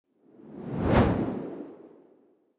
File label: whoosh simple hard